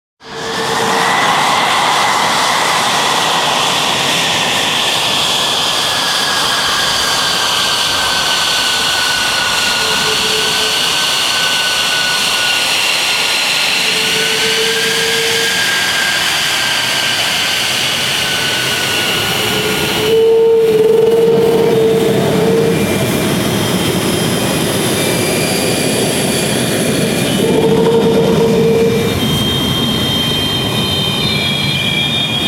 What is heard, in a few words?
Aircraft, Lockheed Martin F22 Raptor, from tarmack; HD stereo audio only